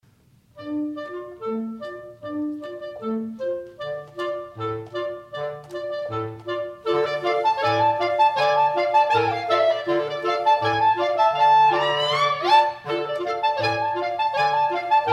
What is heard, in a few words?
Klezmer Dance, clarinet